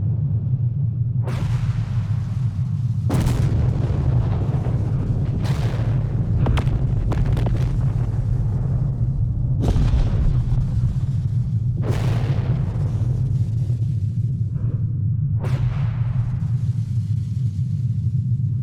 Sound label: amb nexus storm; Stellaris; cosmic storms